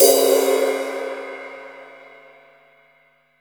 crash R; Zero-G; Chemical Beats; CRASH ZOO

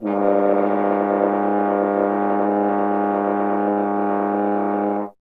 F. Horn; Miroslav Vitous; Solo Instruments VOL-2

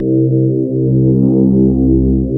electric pianos; Wizoo Electric Pianos

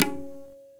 INDIAN PERC

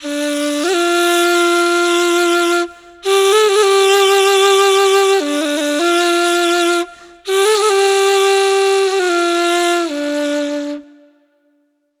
Ney, Wind Instruments; Sonic Foundry (Sony Creative Software); World Pop

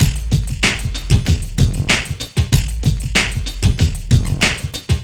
Uber Beat; Sonic Foundry (Sony Creative Software); Ugly Remnants VOL-2

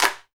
CLAPS, HR-16 Drum Mashines; EdgeSounds

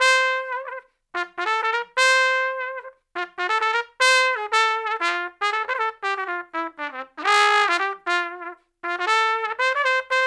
Trumpet Melody; Traditional; Sonic Foundry (Sony Creative Software); Jazz Solos and Sections

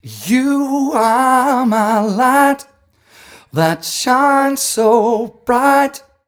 m 128 mel b-flat, Vocals

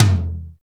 FORCTOM LO, NATUR TOM; Drums In Your FACE!

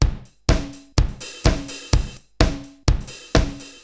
Akai XE8 (125BPM), beats

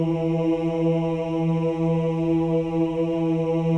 CHOIR OOS, E3; Spectrasonics; Symphony of Voices

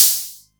Roland MC09 Hat O

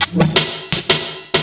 LOOP, 160 BPM, Drum & Bass

